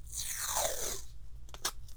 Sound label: Pull Tape